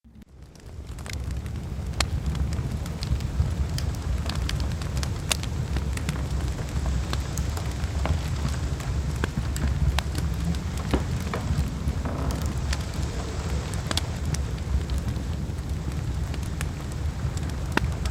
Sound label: Fireplace